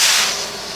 Korg N5 Rock Kit C#3

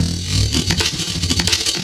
LOOP FX -R, 168 BPM Drum & Bass; Ueberschall; Drum & Bass Resonance

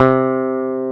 W BASS C3; AKAI S-Series CD-ROM Sound Library VOL-2; MX.BASS

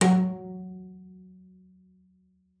F#3, bass pizz; Xsample VOL-5

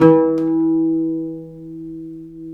LUTH 3 E3, Arabian Traditions; USB Soundscan vol.43; AKAI

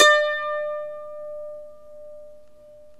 MANDOLIN

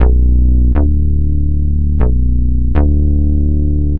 Tough Bass, Bass Foundations; Sonic Foundry (Sony Creative Software); The Electronic Music Manuscript Collection

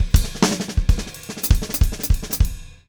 DOODLE, Drum; Steve Gadd Drumscores; GEMS; LICK GEMS

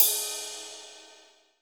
RIDE, ROCKRIDE CYM

